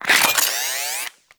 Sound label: SMG Reload C; Tenno; Protea